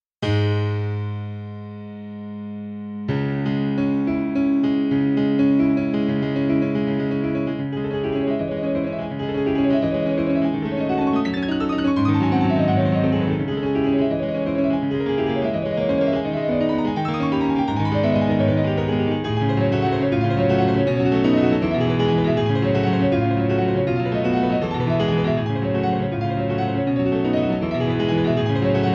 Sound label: Soft Stereo Piano; TR-RACK C01